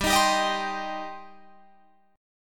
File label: G Suspended 4th Sharp 5th, Guitar Open F; Gsus4#5 strummed; 19,22,19,22,22,19